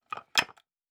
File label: Wood Debris; Fantasy Sounds Bundle; Craft